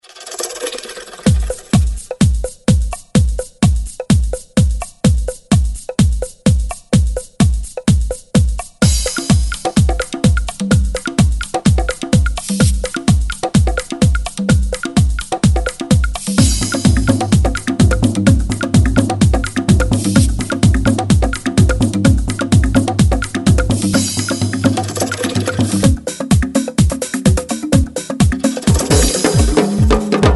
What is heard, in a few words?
afrobeat